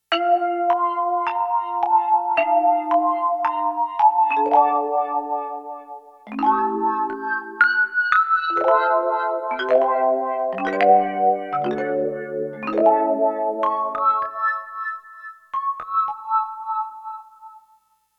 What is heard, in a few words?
MARIMBA, Roland JX-1; Factory presets